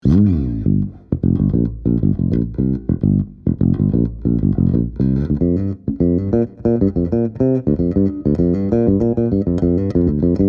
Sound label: bass